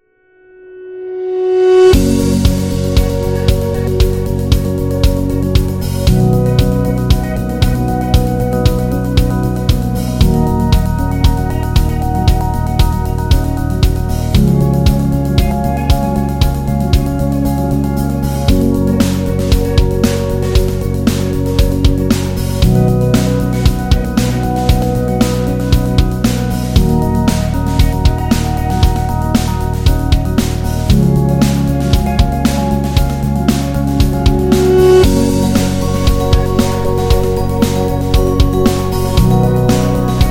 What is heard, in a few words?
Atmospheric Corporate Presentation; corporate music vol 4